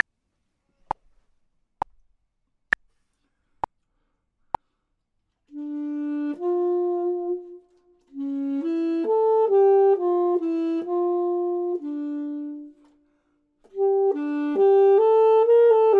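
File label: bottom, Saxophone and Clarinet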